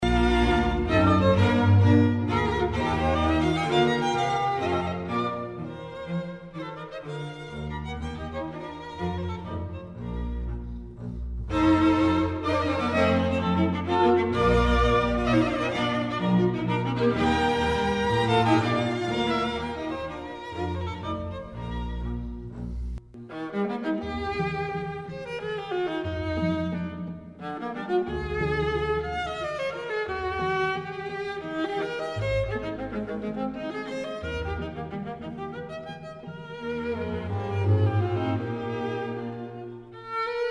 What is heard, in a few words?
quintet; eybler